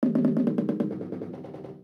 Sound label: Hit Loop, Loops, breaks